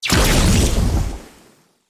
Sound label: Shrimp Lantern Weapon Projectile Burst; Creatures; Baliq